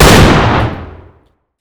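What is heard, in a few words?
fire distant 50bmg rifle interior; gmod; arccw uc commons attachments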